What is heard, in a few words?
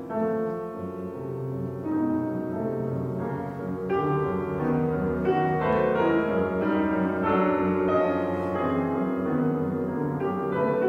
Piano Sonata; Gideon Klein